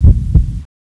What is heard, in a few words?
heartbeat; cstrike